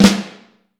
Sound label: Vintage Snare Drum, 6.5X14